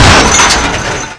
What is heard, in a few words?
chain mg, sound/weapons